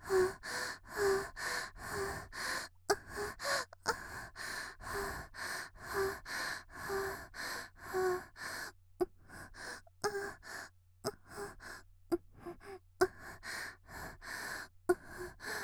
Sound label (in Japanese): 「息」感じている呼吸20秒; 綾波系少女